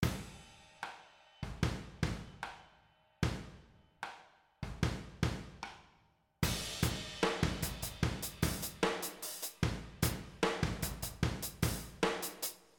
drums overhead

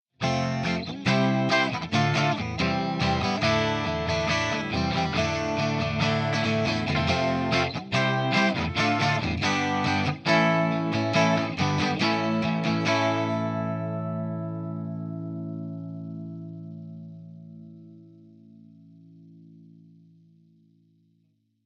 test pedale chords

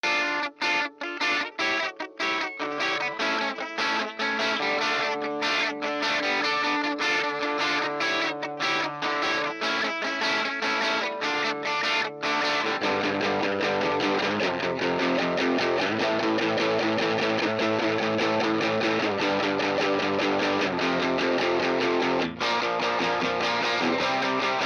g2rythm baffle

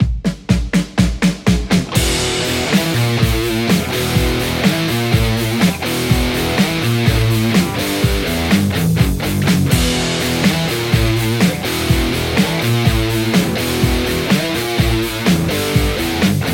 Les Paul; tse; x50 v1 02

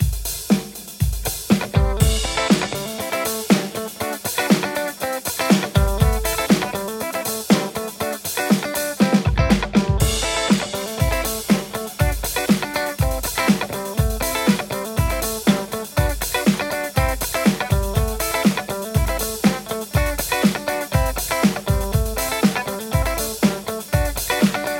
lespaul th3; overloud

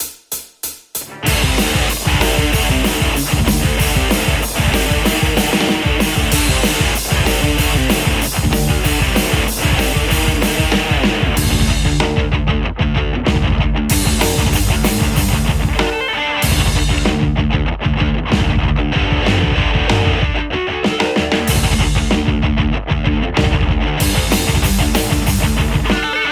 metal tele x88; soldano

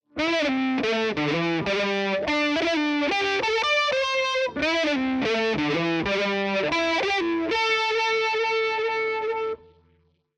mxr srlp solo vintage, ampsims

